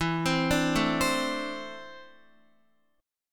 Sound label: E 7th Suspended 4th Sharp 5th E down Guitar 4E 4A 5D 5G 5C 6F; arpeggiated